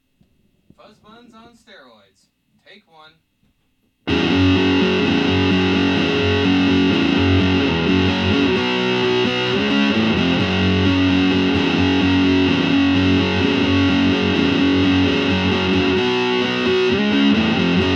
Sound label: fuzz steroids; Anklebiter; Fuzz Bunnz